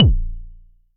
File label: SH32 Kick; Drumset 04